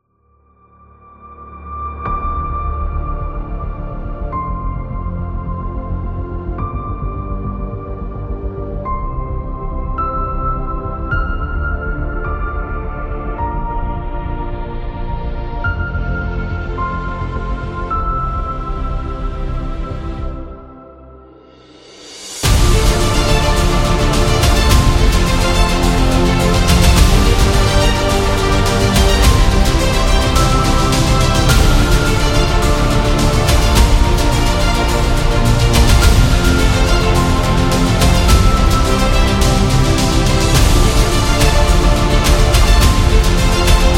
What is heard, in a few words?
Epic Action